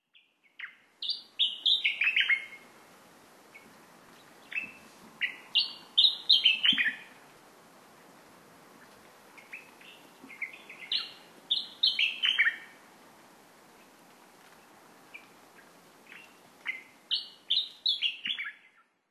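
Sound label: Sakhalin Grasshopper Warbler; nemuro; koe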